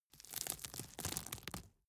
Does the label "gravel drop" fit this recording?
Yes